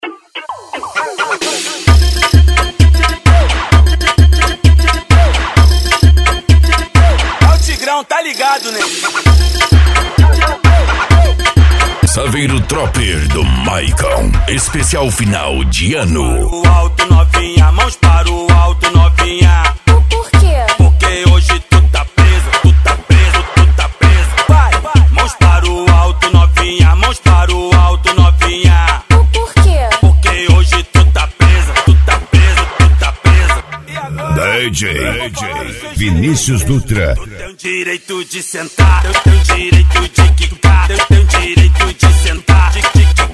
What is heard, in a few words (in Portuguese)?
funk agressivo funk